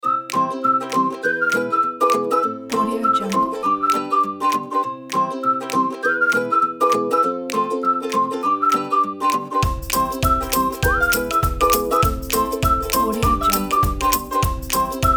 a happy music

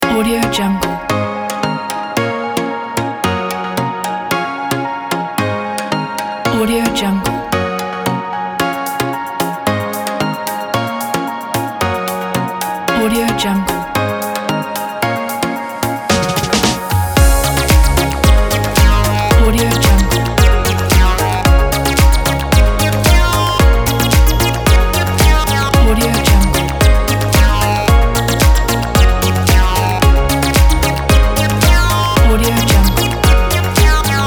happy baby pop fun

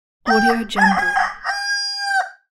rooster

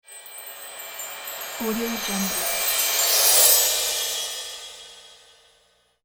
christmas transition

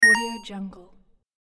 8bit point

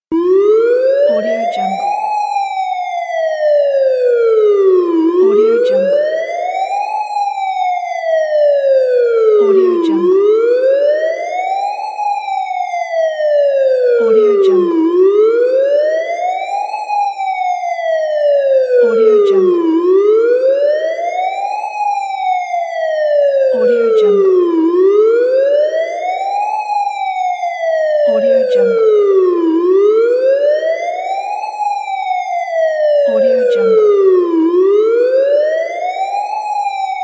siren; 3dhome

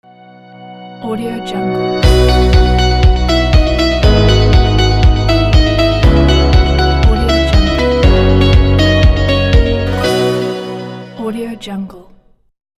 corporate ident

